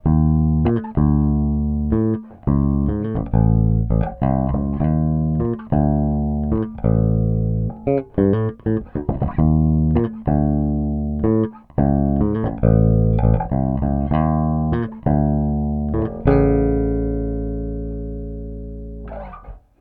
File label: Midboost